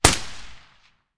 ump45 silencer mono distant

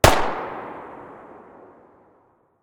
tt33 muzzle mono distant

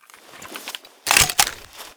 groza grenload, weapons

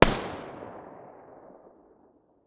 mdr silencer mono distant, weapons

